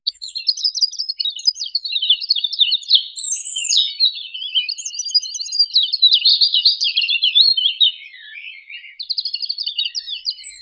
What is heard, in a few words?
birds singing in the fire, spring